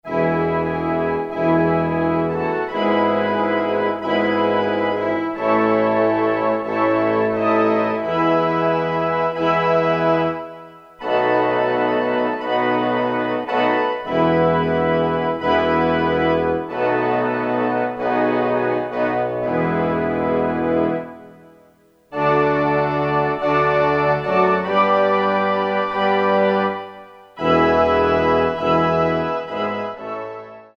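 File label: Mixed ENS, Woodwind & String, Korg DSS-1; Woodwind & Strng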